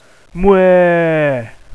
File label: bag sound